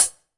HH, Drum Mashines; EdgeSounds

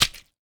Wet Slice, household rhythm samples hits

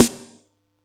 Yamaha EX-5

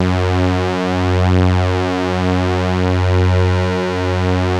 SAW, Synth lead

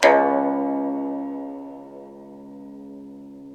SU GZHENG, Earth Tones

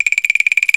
ETHNO PER, PERCUSSION; Crypton - DrumBase5000

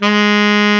SAX G#2; Best Service Alpha Dance vol.2

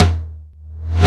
TOM 1, DRUMS; Analogon

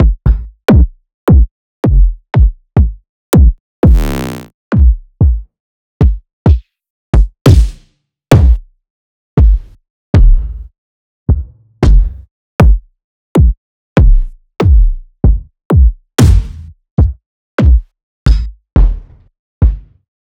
One Shots Kick

